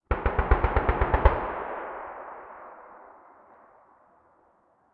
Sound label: combat atmosphere; World of Tanks